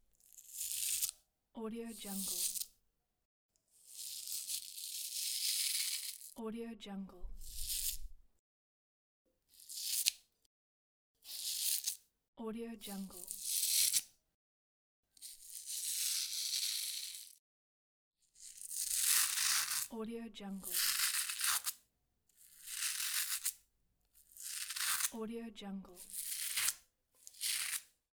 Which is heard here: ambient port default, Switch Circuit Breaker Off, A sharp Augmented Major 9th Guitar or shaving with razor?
shaving with razor